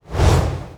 fire swoosh

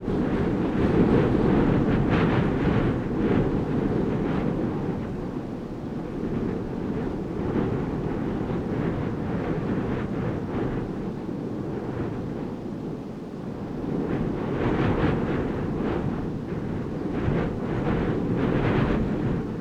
wind gust

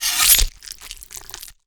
sword removed flesh

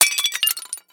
vase break

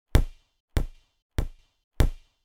plastic ball bounce

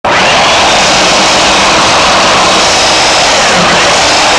HEDGE

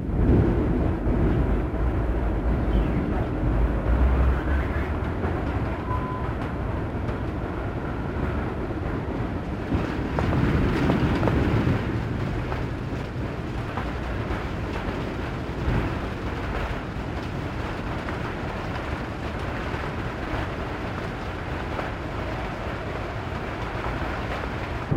wind rain

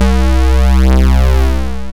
SYNTH

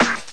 hip snare

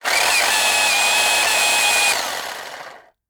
electric planer

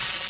velcro rip

